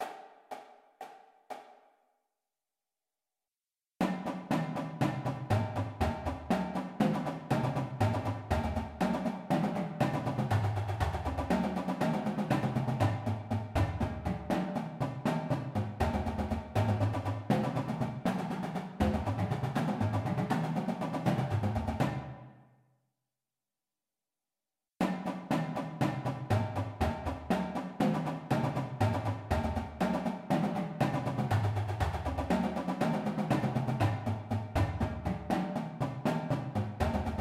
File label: Paradiddles; Advanced Paradiddles; Drumline Warmups